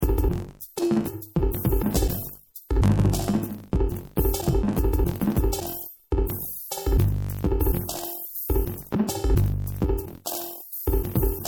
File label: beat crazy